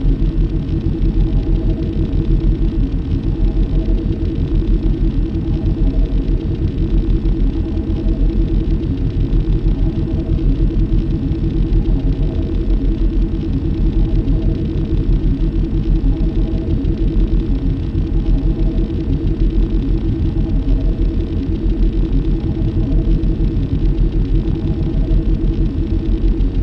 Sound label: shield gen; Star Wars Knights Of The Old Republic I; XBOX